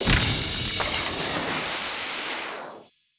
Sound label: start Screen Continue